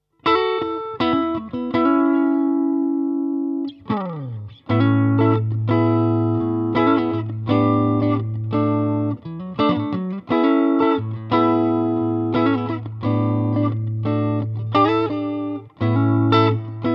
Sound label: Les Paul Crunch